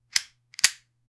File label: pulling a pistol out of, holster